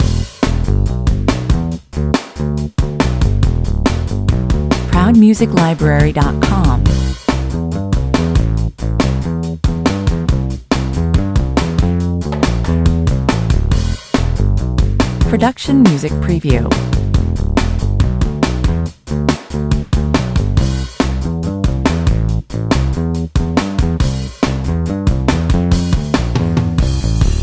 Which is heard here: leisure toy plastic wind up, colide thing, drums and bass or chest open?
drums and bass